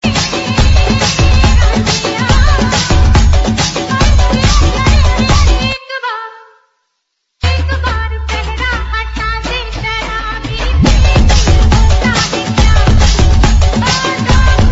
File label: Hindi Song Ringtone; ringtones